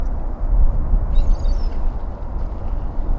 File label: gull screech; birds